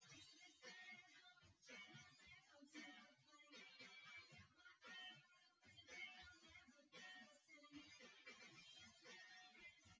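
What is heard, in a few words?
with drums synth conv 250ms 5cm; SiSEC08; SiSEC underdetermined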